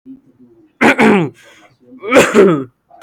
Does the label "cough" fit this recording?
Yes